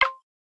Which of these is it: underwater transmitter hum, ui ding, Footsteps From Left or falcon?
ui ding